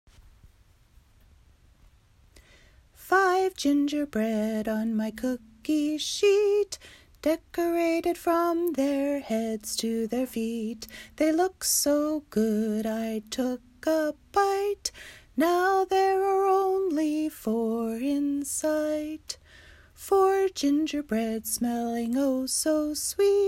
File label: Five Gingerbread Song a cappella